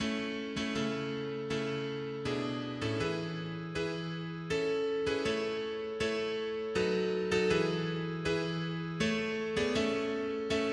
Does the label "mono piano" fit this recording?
Yes